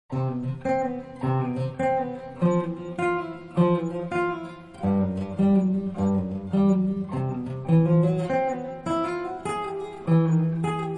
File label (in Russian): Скерцо Etude; No.1